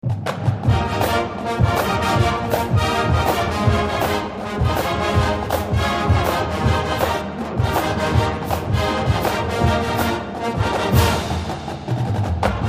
Marching Band; Michigan Marching Band

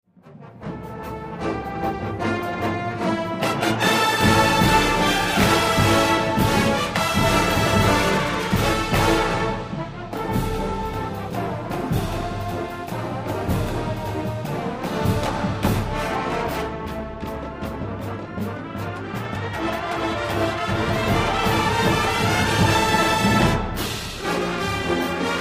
Marching Band; Michigan Marching Band; hurrah

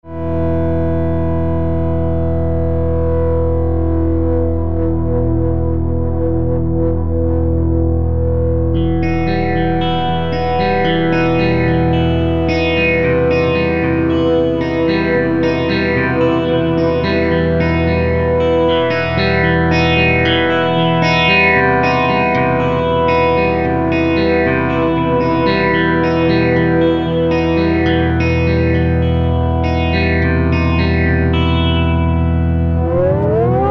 Drones

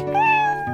meow

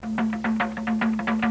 indian drums

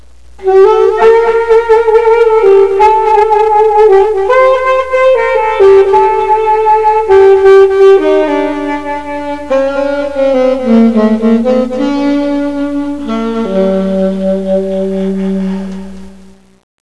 sax

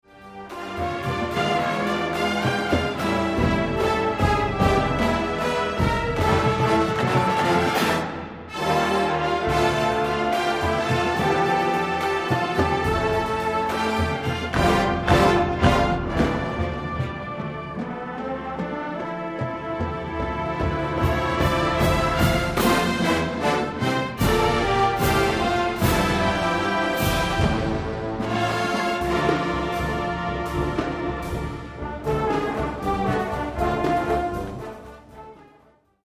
Marching Band; Michigan Marching Band; hurrah

